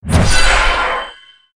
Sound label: blackberry message